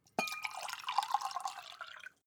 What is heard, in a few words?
mug pour; soundpool